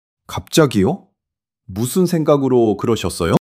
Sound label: surprise